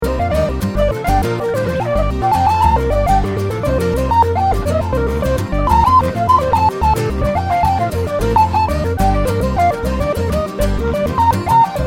scrambled loop

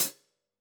KHats Clsd

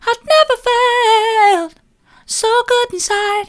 never felt so good inside soft, vocal

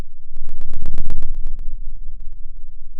pop, Sound of a thunderstorm

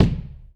Acou Kick, drum

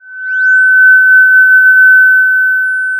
Sound of a police car siren

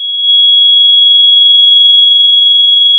Sound of a tea kettle whistling

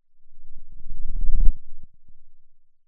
Sound of a lion’s roar

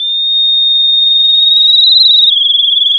pop, sound of a tea kettle whistling

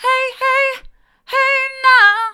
hey now hard, vocal

